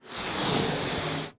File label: Cartoon Balloon Being Blown Up; AdobeCC